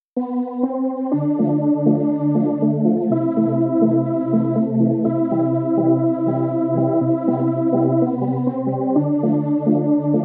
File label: Hurdy Gurdy (Organ)